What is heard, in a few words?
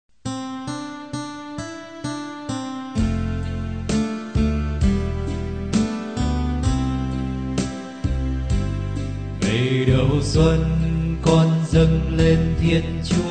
ALTO